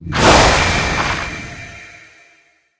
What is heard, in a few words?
blackghost dead, monster